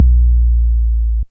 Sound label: 808 run; Gangsta Sound Kit